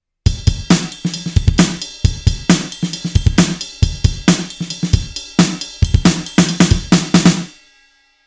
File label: Drums Drum Hall